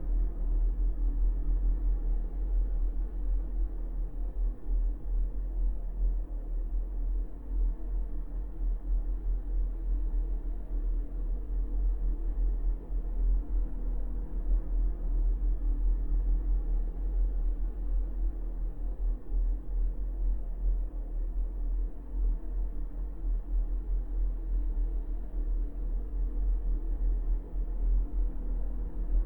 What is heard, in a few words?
ambiance; Five Nights at Candy's 2